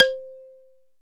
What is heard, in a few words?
MLT R8 XYL, R8 Xylophone; Roland LCDP11 Africa VOL-1; Bala & Xylo